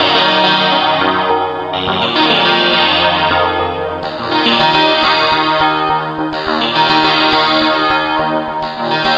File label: 104 morbid echo synth melody